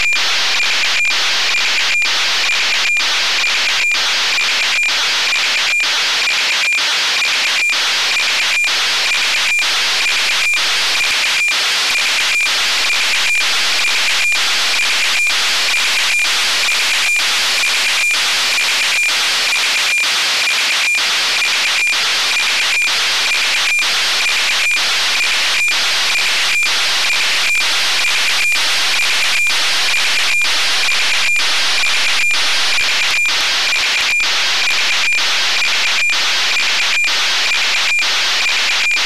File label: 435363kHz; AIST-2